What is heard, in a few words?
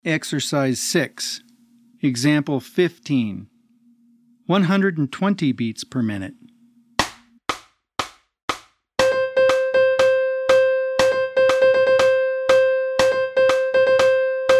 120 BPM